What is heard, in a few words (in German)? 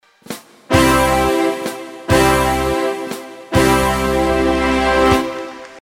Tusch lang